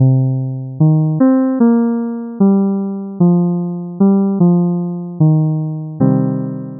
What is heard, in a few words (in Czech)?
melodie